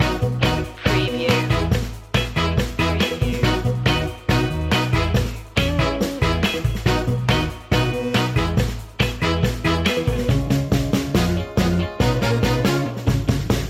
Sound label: old school detroit loop